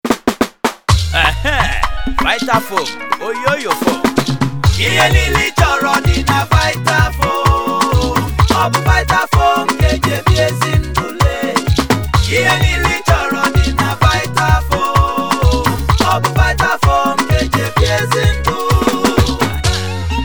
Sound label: Theme Jingle Igbo; Vitafoam 2014